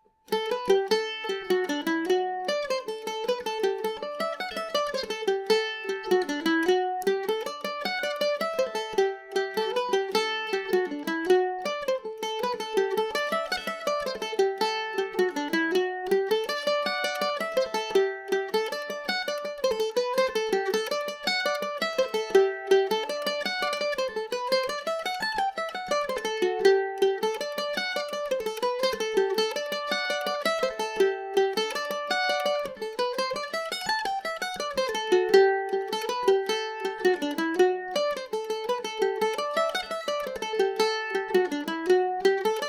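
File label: D mixolydian, Irish mandolin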